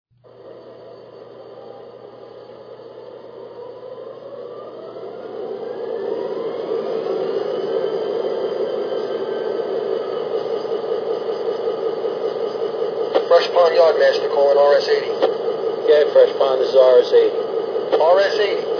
gp38-2, electric trains

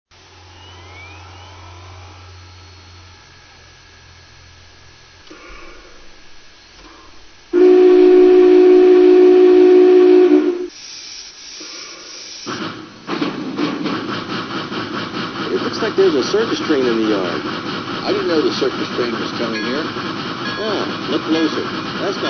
BIG BOY 4000

